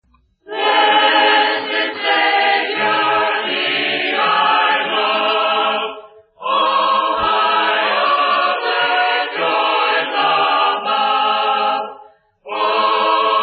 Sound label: a capella hymn; blessed savior thee i love